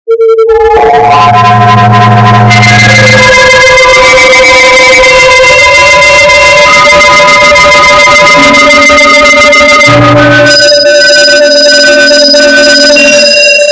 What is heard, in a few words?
noise